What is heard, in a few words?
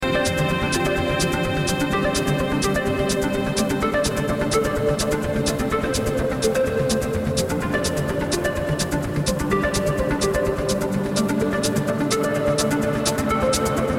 Violins